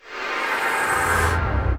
Healing; battle item

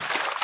Fishing fight

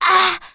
voice damage

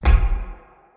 Hit; Pochi

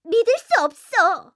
Lose Point; lucy

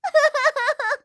voice Cry; lucy

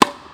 ball charge

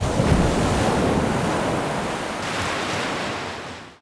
tunami

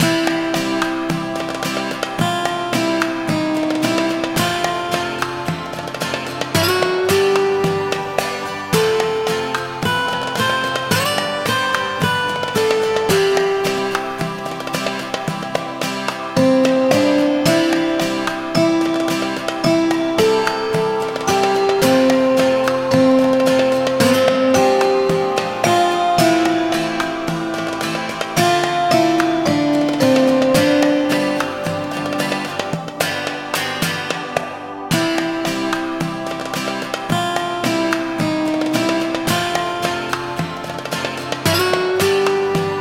snow; My Home

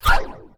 battle item; Poly

